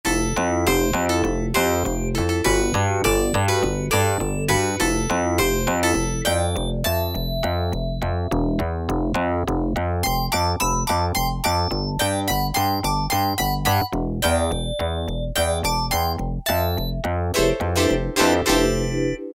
BASS-SYNTH, Casio VZ-10M; VZ ROM Card

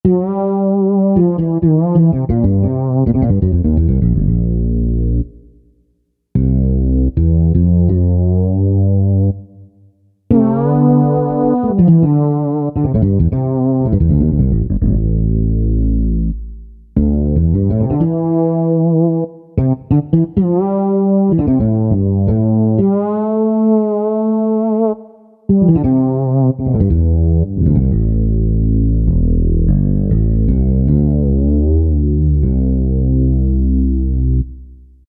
JP FRETLESS, Roland SC-880; Factory Patches

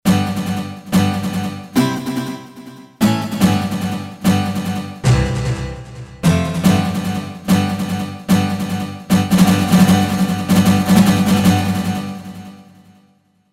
1 KEY STROKE, Roland SC-880; Factory Patches

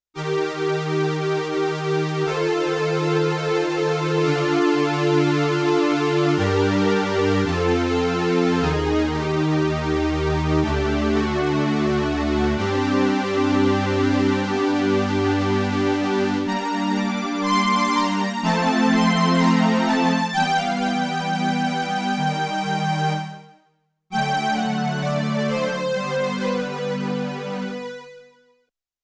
Double String Prophet; Yamaha TX16W

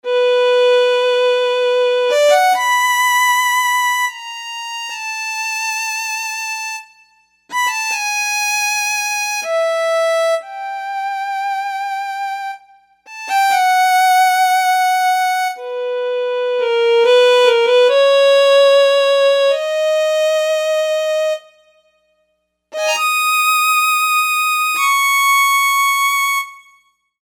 Solo Violin

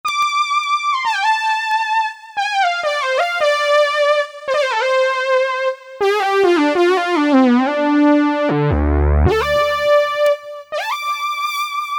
Texas Saw

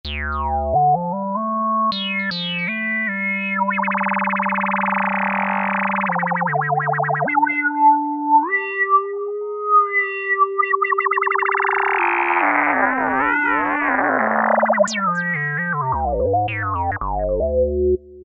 Lead, Korg MS2000; Factory Patches

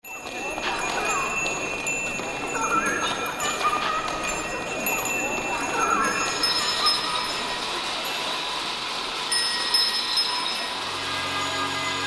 Asia Atmospheres; Spectrasonics Heart Of Asia

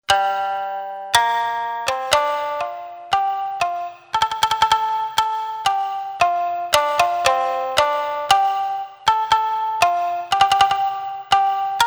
Shamisen

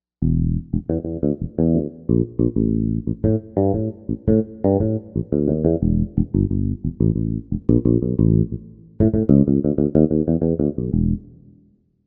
E. Bass, Korg M1Rex; Factory Patches

